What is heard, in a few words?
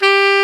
TENOR SAX; AKAI S-Series CD-ROM Sound Library VOL-7